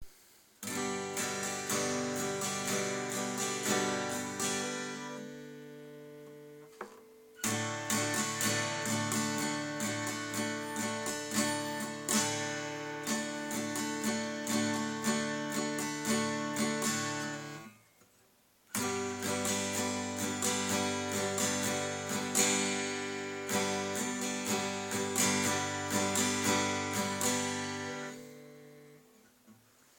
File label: killing a guitar softly a bit of country